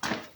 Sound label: foot steps; Walking; fmod-moloch